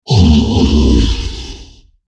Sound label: die, troll